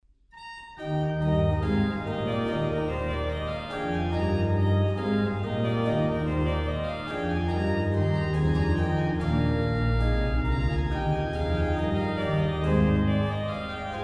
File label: trumpet tune